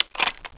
lock; duke nukem total meltdown

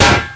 steel barrier; PC Gamer IT CD 45; DRIVER

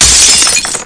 sml, impact glass; SINDEMO

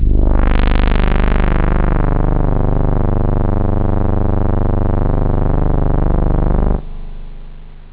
danger, synth

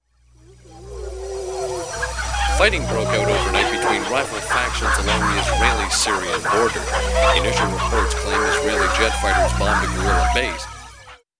chicken

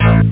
dragon bass

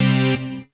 synth stab

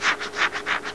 scratches; SysError

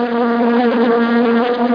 H BUZZ

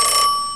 phone

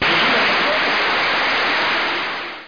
zap; packard bel

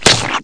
splat; REDNECK; RAMPAGER

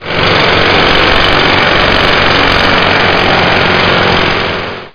repair

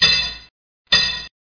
stone cut; Lords2

